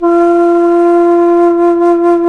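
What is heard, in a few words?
alto flute, vibrato; Roland L-CDX-03 Disk 1; A.Flt vib 1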